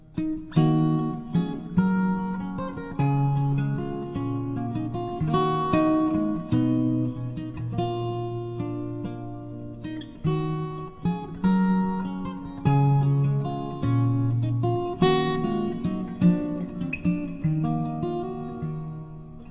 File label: Classical Ringtone